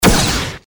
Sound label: robot gun shot